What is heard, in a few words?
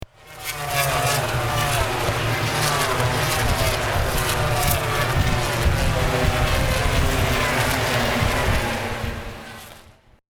Racing SE